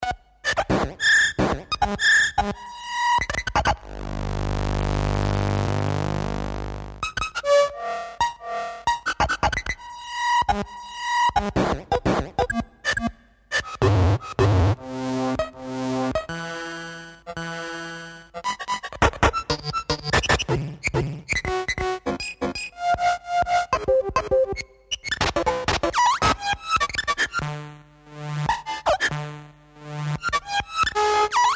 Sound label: tape walk